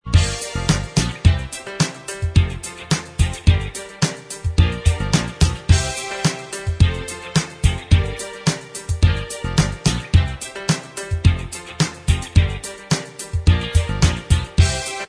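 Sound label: instrumental track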